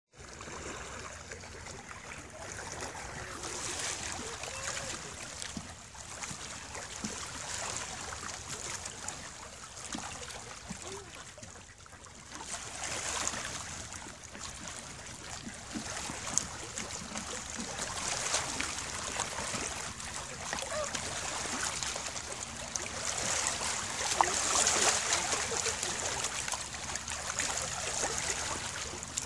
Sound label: lake calm, Calm waves; Sound of the waves of the lake; lake garda